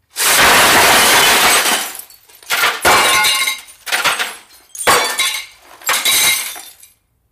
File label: Glass Impact Debris; shards